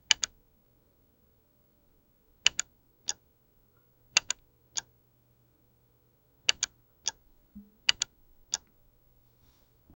clicks de camara; photography

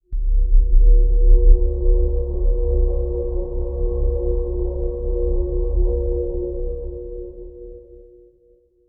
pulsos graves tipo bajk, magical

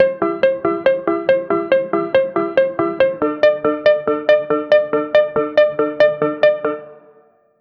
un arpegio de re; instrument

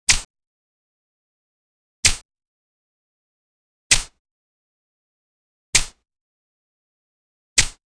el sonido de la cuerda, bow and arrow

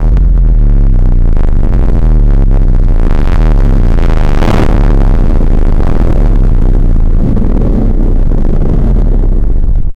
pedo de 47 segundos tiene, airplane engine

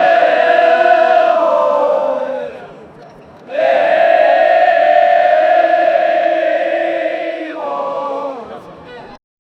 gente coreando un concier, audience

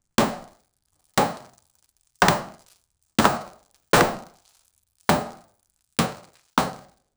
vaciando pelotas plsticas, pool

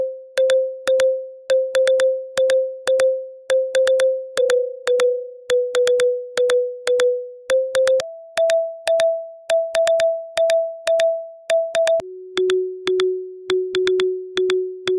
crea una melodia de llama, apps

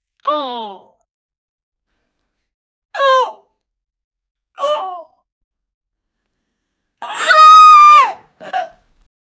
gritos de dolor de una, pain

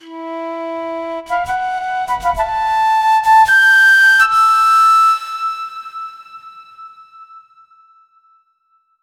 el flautista debe generar, flute

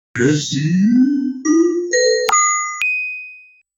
apertura de un portal

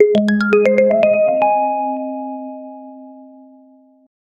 arpegio corto en mi lidio; educational

